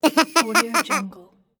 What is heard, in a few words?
cartoon laugh